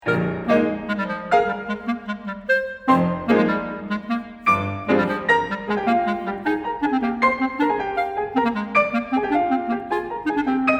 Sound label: Clarinet Sonata III. Allegretto giusto; Copland